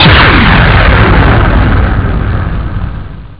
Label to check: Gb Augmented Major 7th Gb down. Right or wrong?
Wrong